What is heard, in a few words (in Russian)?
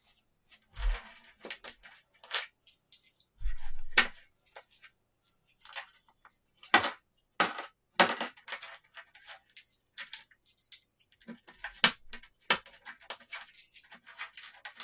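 собирать считать и бросать монеты; zvuki zvona monet i kopeek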